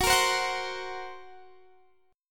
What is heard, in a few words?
F sharp Major Flat 5th F sharp Guitar Standard; F#Mb5 strummed